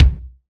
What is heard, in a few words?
verby kick drum one shot b key